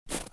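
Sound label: step snow; step on snow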